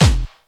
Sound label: KIT, Dance Mega Drums; Best Service